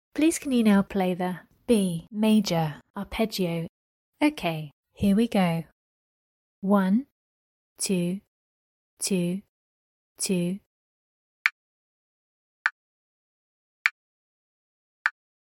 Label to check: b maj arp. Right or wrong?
Right